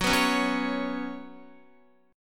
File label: Gb Suspended 4th Gb, down, Guitar; strummed; JGuitar